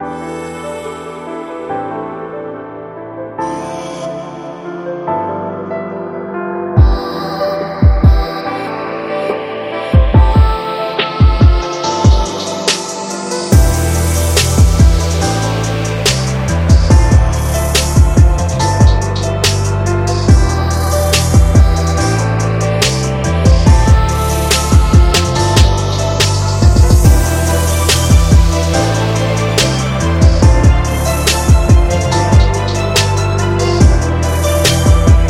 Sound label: Luxury Soft, lounge